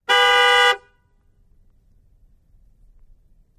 Expedition Horn Honk, ford expedition

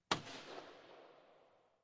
Hockey Puck Hits

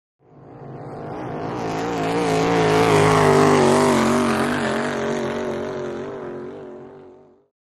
Power Boat By Fast, off shore power boat

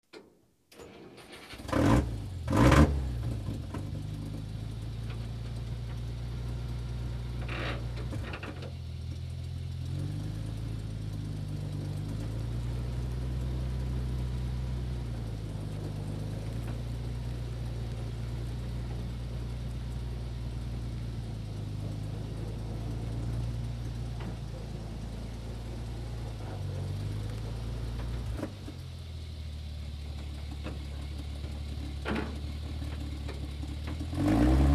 Dodge Fire Trk Start B, fire truck

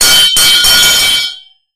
Impact Metal Pipe

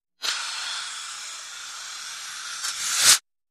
Strange Reversed Air Releases Vary In Pitch

